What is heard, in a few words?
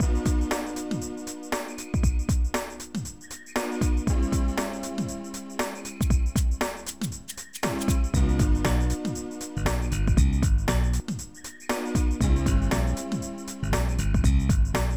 Corporate